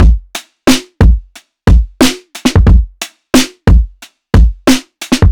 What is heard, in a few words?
Snare